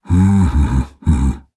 Nonverbal Hum; Ornn

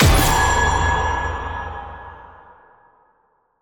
Enemy Boss Counter Attack Hit; bloom minigame

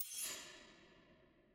ui page skip dont; spirit blossom

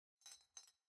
Foley Ponytail

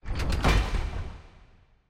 smelter puzzle move

